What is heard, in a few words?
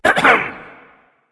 nv clear throat; smite; voicepack; mercury